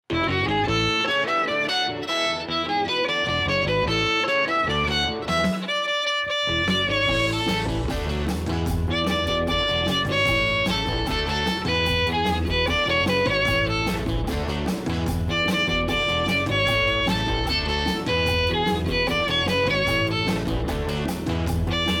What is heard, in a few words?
Violin